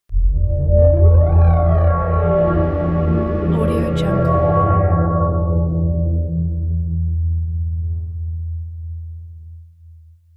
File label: horror tension thriller